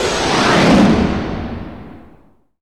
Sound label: NOISE, Production Effects